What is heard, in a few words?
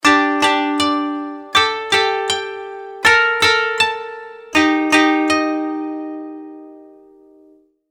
koto